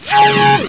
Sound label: DOOR OPEN; Meteor